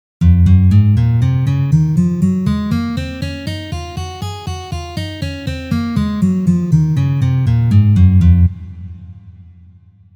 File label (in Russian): Gamma Do Diez mazhor 1 pozitsiya